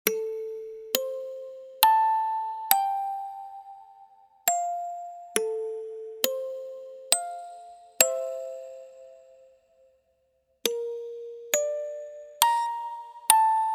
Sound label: music box lullaby loopable; rockabye baby lullaby